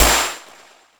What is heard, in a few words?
fx-percussion, Techno; Techno ID; single-drums